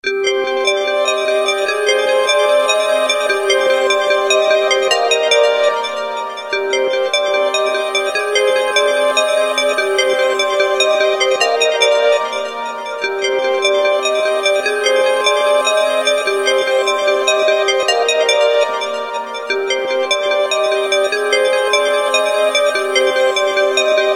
Nokia Songette, Ringtone

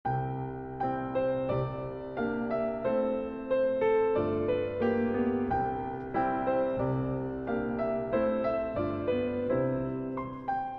LG Cold Piece, Ringtone

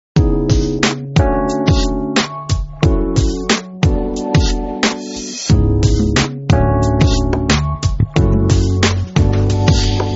Nokia Deep red funk, ringtone

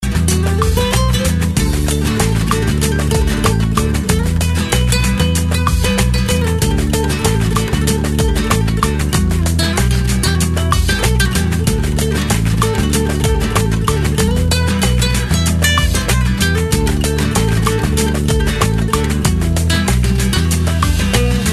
Guitar, Ringtone

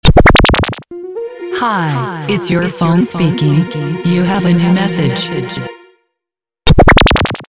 Good Msg Tone; sms

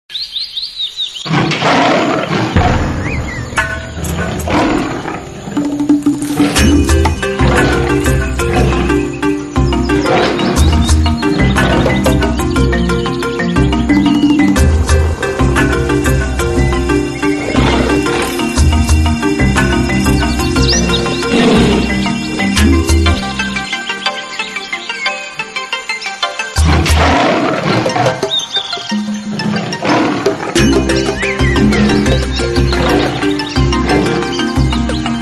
Heartbeat Of The Wild, ringtone; Samsung Galaxy S3

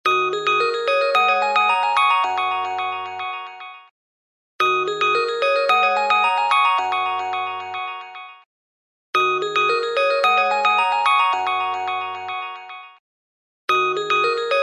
LG Chillout Drop, Ringtone

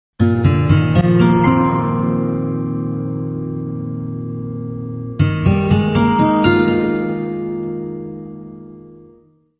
Blossom, ringtone; Nokia

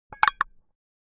Nokia Email, Ringtone; Email 5

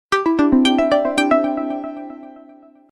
Lg New, Ringtone

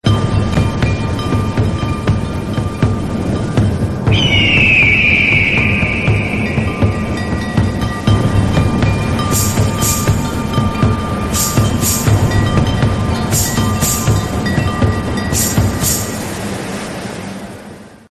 Eagles Call, ringtone; Samsung Galaxy S3

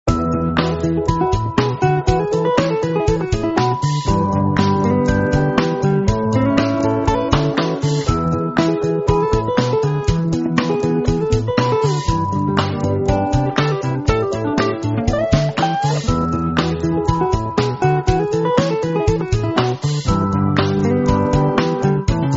Nokia Rosewood, ringtone

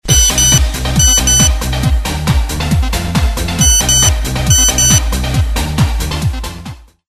Nokia Mix, SMS Ringtones

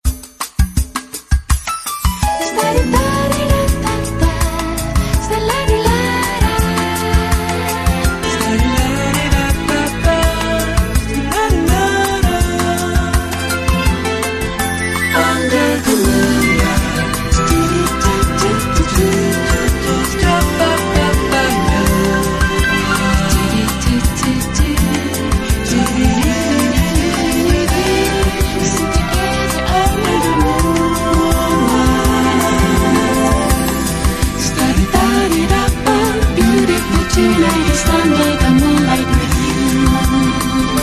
LG Moonlight, Ringtone

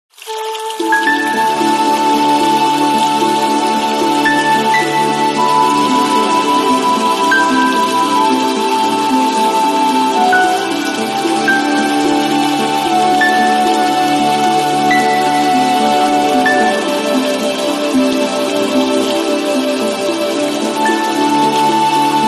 Samsung Galaxy S3, ringtone

